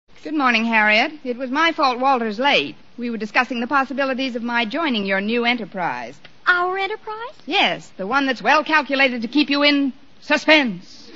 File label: Suspense